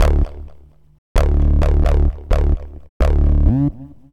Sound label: Contortion Bass; Zero-G Smoke